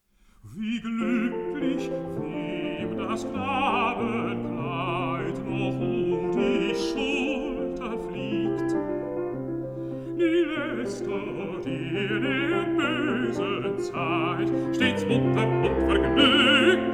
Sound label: song for voice & piano